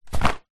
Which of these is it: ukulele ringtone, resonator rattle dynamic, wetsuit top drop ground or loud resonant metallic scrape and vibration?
wetsuit top drop ground